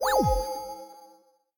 chest appear; Crypt of the NecroDancer

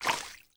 ice melt; Crypt of the NecroDancer

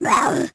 grabbed; Crypt of the NecroDancer

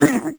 squished; Crypt of the NecroDancer

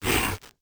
mimic chase; Crypt of the NecroDancer

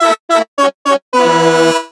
horn; TrackMania United; Skins

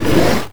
dragon attack prefire; Crypt of the NecroDancer

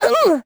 squish; Crypt of the NecroDancer

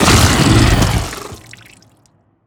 worm death, creatures; Factorio

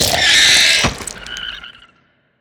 worm death small, creatures; Factorio

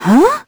confused; Crypt of the NecroDancer

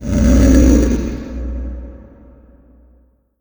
coralriff hit; Crypt of the NecroDancer

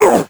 squish; Crypt of the NecroDancer

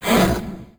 skeleton knight death; Crypt of the NecroDancer

